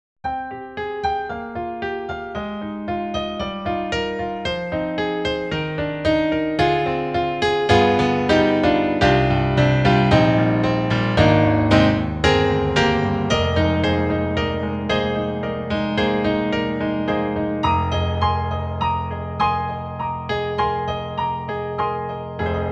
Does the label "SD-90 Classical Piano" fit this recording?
Yes